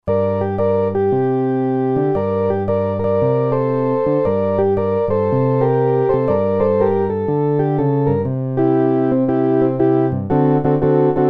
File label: Organ; Roland SR-JV80-08